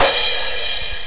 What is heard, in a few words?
cymbal